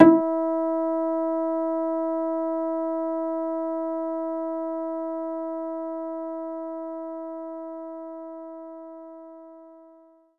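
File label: Acoustic Bass G sharp 3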